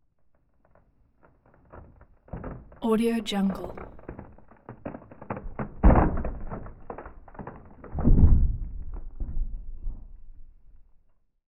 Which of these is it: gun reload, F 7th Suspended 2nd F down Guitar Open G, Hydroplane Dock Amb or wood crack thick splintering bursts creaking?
wood crack thick splintering bursts creaking